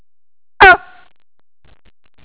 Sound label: guh